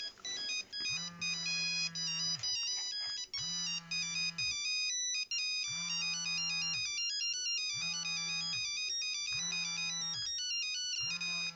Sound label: nokia 5510 ringtones